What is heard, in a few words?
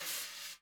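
brush single; Northstar - New Gold VOL-2; 30S BRUSH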